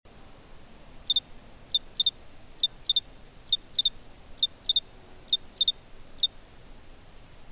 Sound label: RINKY DINK CRICKET HALF SPEED